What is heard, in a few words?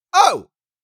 Male vocalization shouting to get attention "ohh"